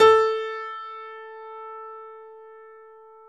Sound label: A3 YAMAHA, GRAND; NS GRAND